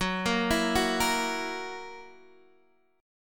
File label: Gb 7th Gb down Guitar Standard; arpeggiated; capo=3